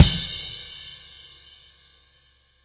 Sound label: Happy Pub Beat Outro, Indie Rock; Sonic Foundry (Sony Creative Software); Indie Rock Anthems; Session 05 - Happy Pub